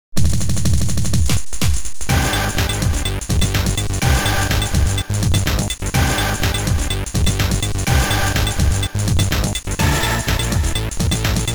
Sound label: Techno Party; Apidya; Amiga